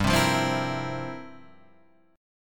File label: F Major 7th Suspended 2nd Suspended 4th, G, guitar